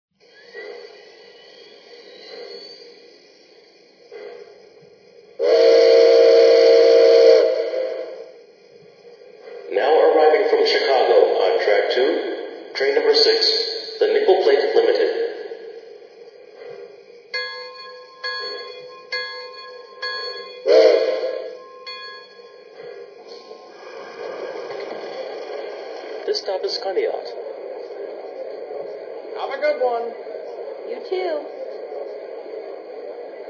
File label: berk, electric trains